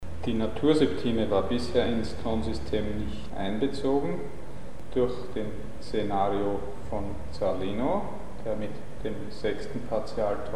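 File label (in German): Diverse Mikrotöne im Zurechthörbereich Kleine Septime; Mikrobuch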